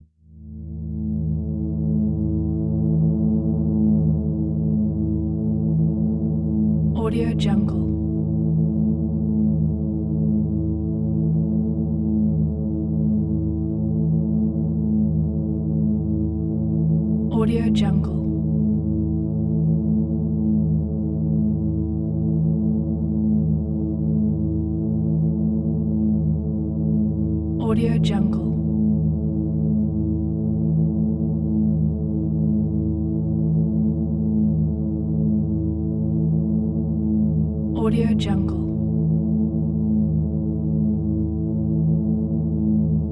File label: magnetic field